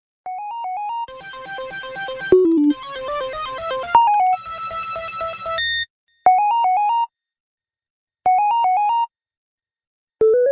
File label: Video Game Ringtone